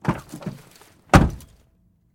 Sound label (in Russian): shelkayushij rezkij priglushennij zvuk